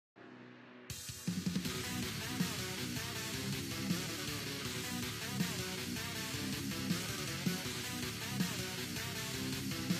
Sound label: phonk